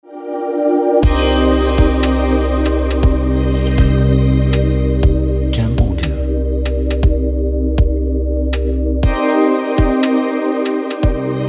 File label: Ambient Chill Trap